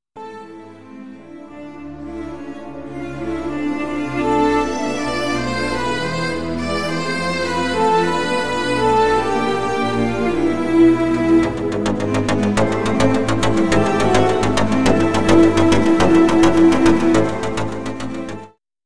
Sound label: Tango